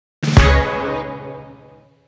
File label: Reward Jingle; (Gold Record)